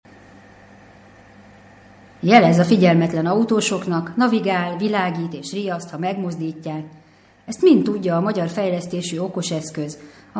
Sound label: shoka bell